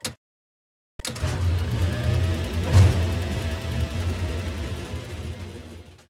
gun reload; World of Tanks

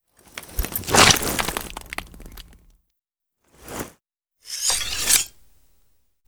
foley pull knife stereo; Modern Warfare

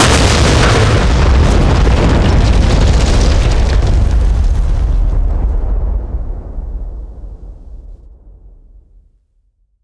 Explosions Explode Ground; DCS

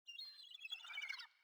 sting gulls; Rust

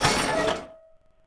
Artillery Fort; Civ4 warlords

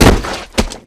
bodyfall water

